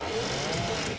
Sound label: shredder hit; Tanks